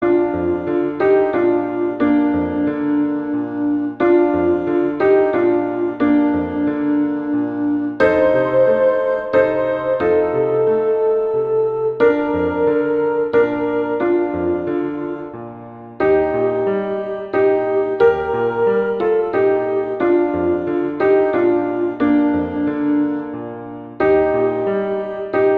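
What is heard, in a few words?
Silent Night, string quartet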